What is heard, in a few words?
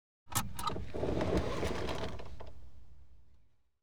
Car, Interior, Seatbelt Released; Auto; BBC Sound Effects Library